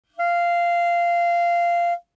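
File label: Eb Clarinet fortissimo F4; Woodwinds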